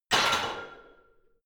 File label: barbell heavy plates replace rack hard, fitnessstudio